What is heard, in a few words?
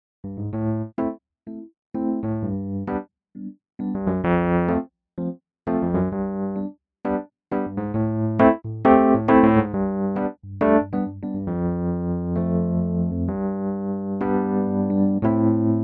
Rhodes Tremolo; Kontakt7